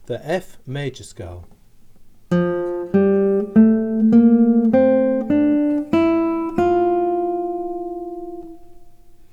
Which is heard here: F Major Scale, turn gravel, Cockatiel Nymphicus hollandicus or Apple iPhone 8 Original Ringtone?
F Major Scale